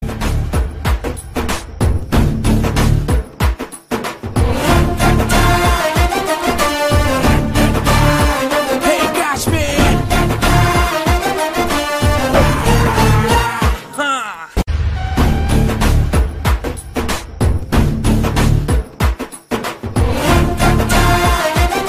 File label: BGM